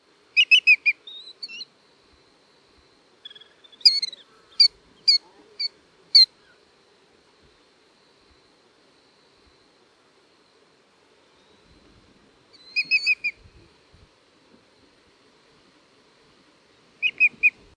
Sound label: Yellowlegs Greater